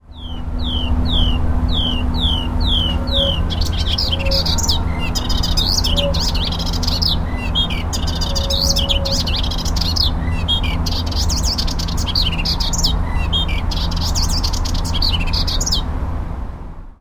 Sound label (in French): Hypolais polyglotte; Ile Monsieur